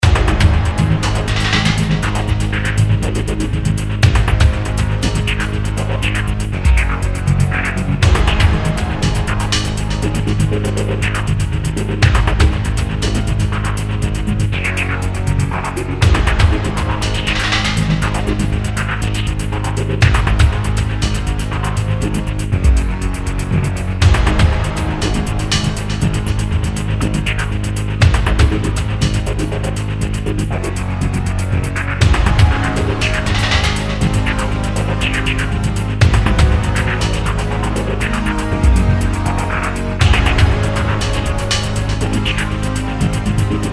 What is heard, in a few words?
Amazon - Ambience